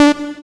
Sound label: SYN POLY, JD 800